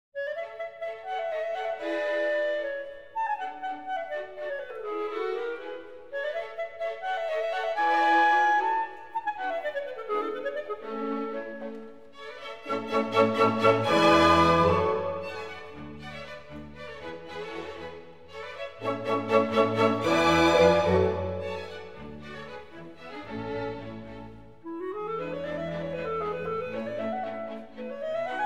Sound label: Concerto for Clarinet and Orchestra; Mozart